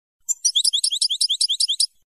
floreo chivi chivi; campero

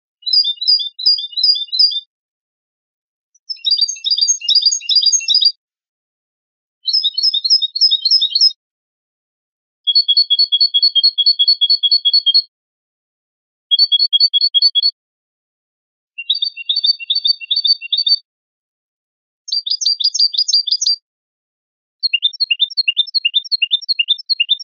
rep fantasia

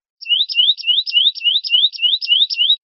piada celuna, verderon

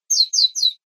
primaveras, verderon

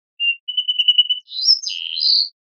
primaveras, verderon

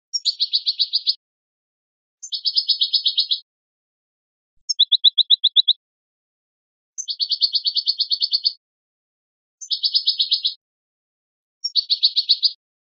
repertorio padreos corridos, campero

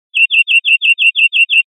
chones corridos simples, verderon

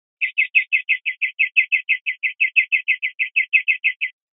golpes corridos yioyio, verderon

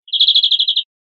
chorros cortos golpeados, verderon

